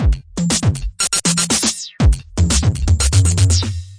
120 bpm, meta electro zapper, drum loops and breaks